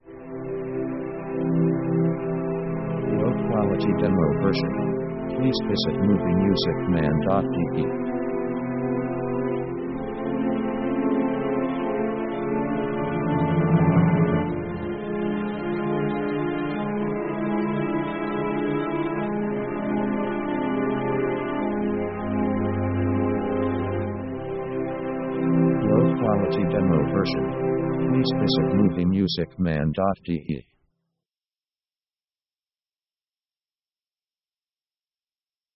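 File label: orchestral epic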